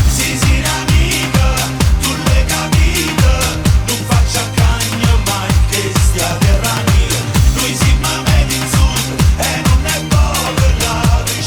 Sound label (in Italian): remix sigla; made in sud